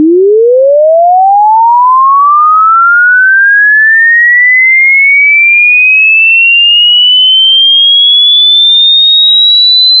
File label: sweep